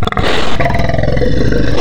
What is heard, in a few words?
Pig, Animals Wavetables; Cymatics; Serum; Animals for Serum - Gold Edition